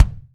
focused bass drum one shot g key, Royality free bass drum sample tuned to the G note; kick drum samples